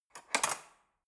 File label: cutlery fork drawer handle scrape soft